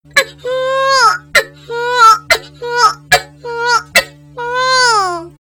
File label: superjump; ff2